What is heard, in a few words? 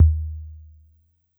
F2 Analog Tom, Dance Kit